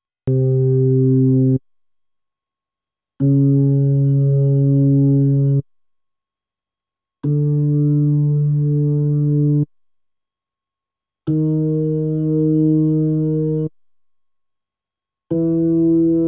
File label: B3 Organ; Masterbits CLIMAX 8